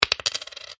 money coin drop, Coin Drops Onto Table; Coin ( Single ) Drops Onto Wooden Table; Objects; McKinney Sound

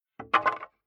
Small Piece Of Wood Dropped On Floor Bounces; Tom Hutchings

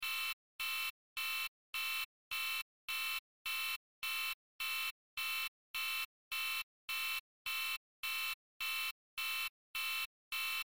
Sci Fi Alarm Buzzer Beeps Technology Readout Loop; Control Panel

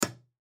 Cupboard Hinge, Cupboard Hinge Click; Cabinet Doors; Household; Blastwave

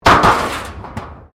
METAL BIN SCRAPE CONCRETE

